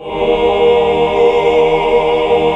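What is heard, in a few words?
chord 2 7 L; Best Service Gigapack 1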